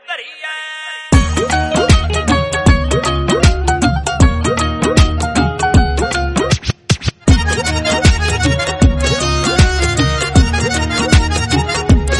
BGM

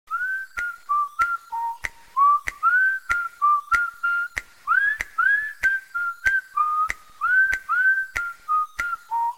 Huawei Mate 40 Pro Whistle

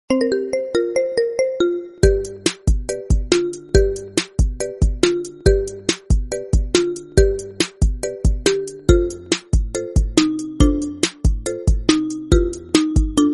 Iphone Remix Bgm, ringtone

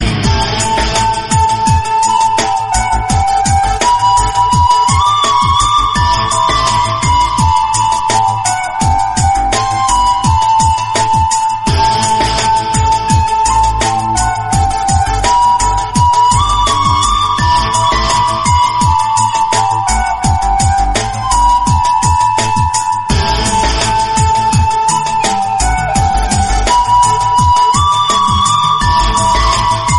Bhakti Instrumental

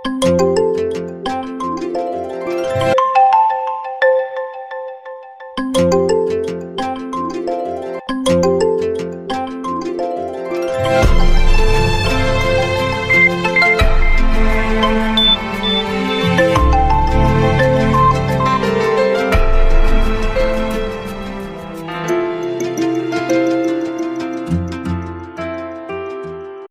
Vivo X30

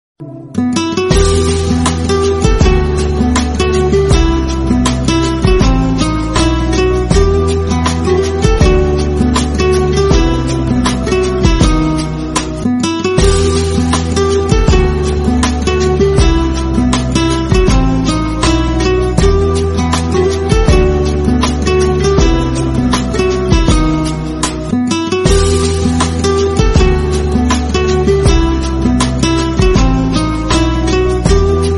Romantic BGM, ringtone

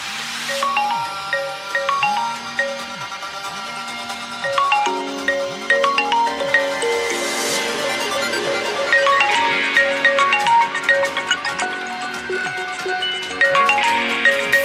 Vivo Y51a, ringtone

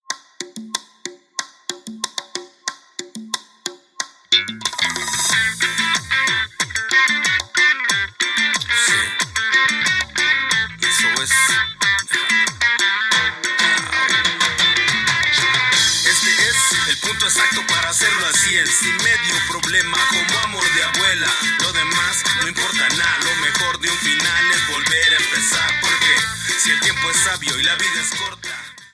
Vivo Y76 5G, ringtone

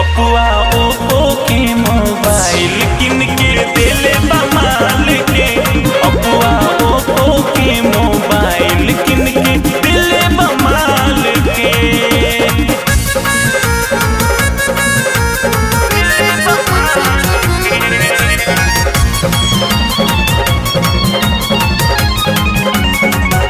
Oppo Mobile Funny, ringtone

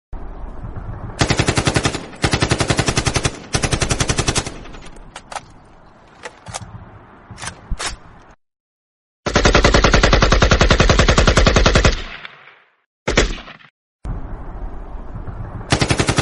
AK 47 Gun

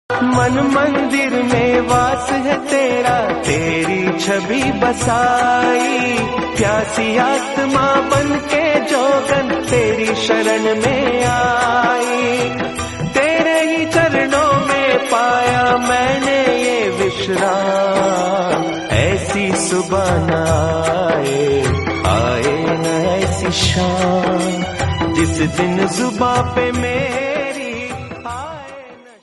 Shiv Bhajan, ringtone

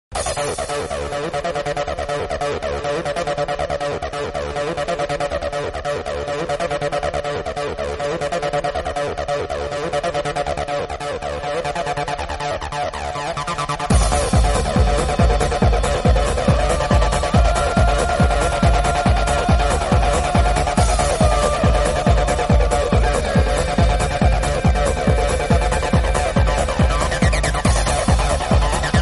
Trance, ringtone

